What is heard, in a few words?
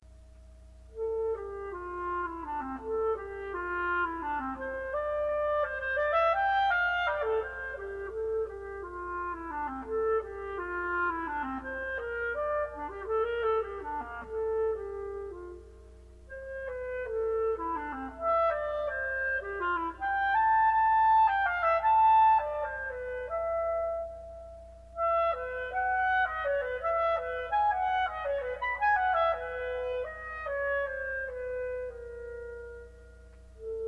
Solo Clarinet; Premieres Tendresses; Eugene Lacroix; Images for Solo Clarinet